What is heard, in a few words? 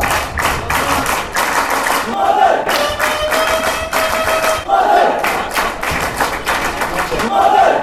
livingstone clap